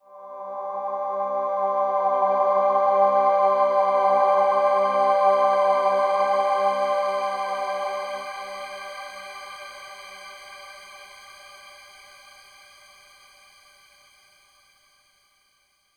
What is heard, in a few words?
Overlay Drone